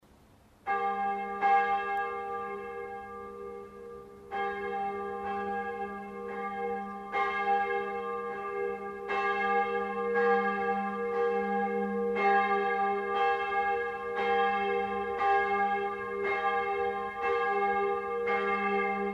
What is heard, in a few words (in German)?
Glocke Dreifaltigkeitsglocke, Stift Wilten